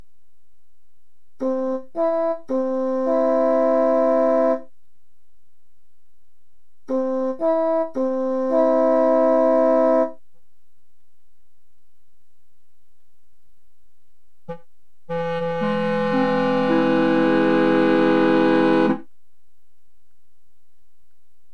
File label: thirds